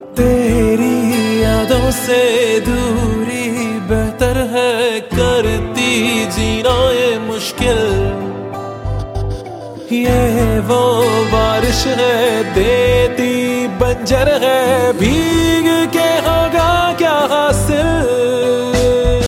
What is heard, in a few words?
sad ringtone